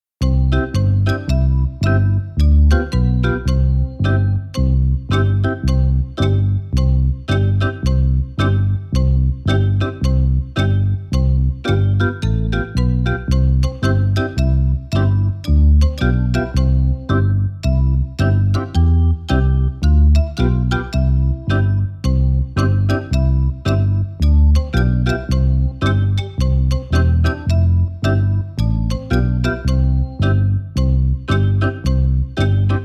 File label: Itsy Bitsy Karaoke